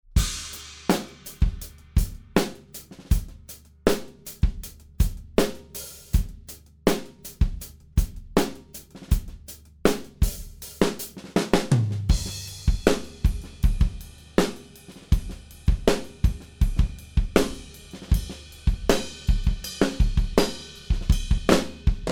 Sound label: ORTF